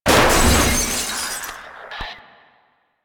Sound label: Destroy Television; Warframe; Ambience; Nineteen Ninety Nine